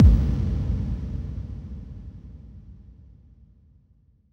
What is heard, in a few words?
bassdrum reverb FX kick; Vengeance Samples